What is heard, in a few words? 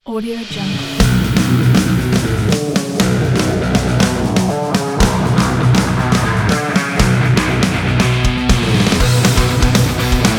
metal; top-chart-music